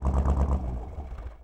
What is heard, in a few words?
hotrod, vehicles; warthog left